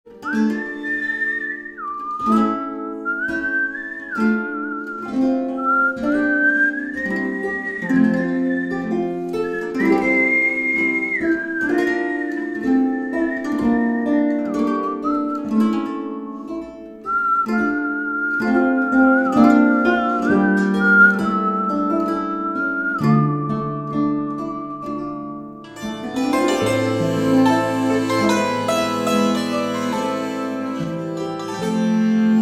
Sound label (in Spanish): suave melodia